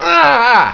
scream; wanted

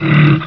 alert, grizzly; wanted

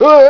pain, miner; wanted

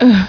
pain; wanted

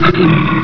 pain; wanted

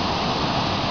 bow fly, weapons; wanted

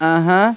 uhhuh

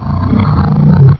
pain, monsters; aomclassic; face

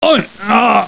pain

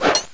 knife hit; wanted; weapons

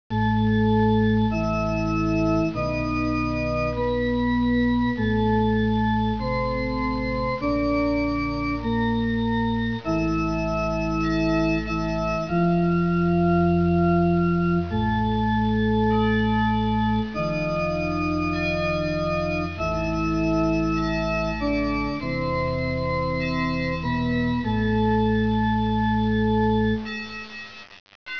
haunted, Ambience

